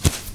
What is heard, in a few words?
attack, weapons; windrunner